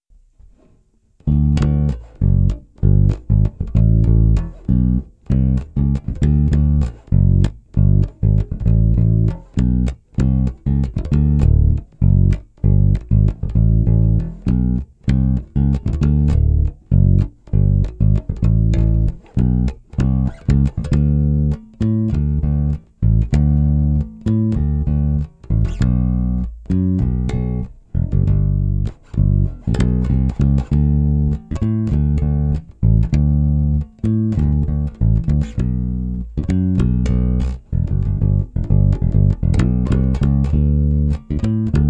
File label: Soul Mix, basses; Hybrid 5